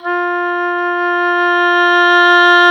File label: WND OBOE Dry